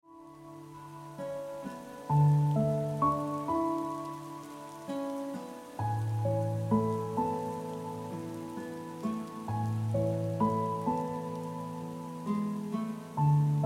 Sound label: Ringtone